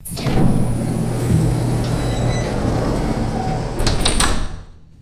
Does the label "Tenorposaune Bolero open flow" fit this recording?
No